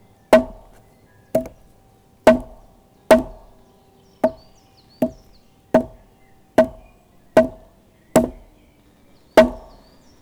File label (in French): Gourdin buche Tom grave serie; Percu Bois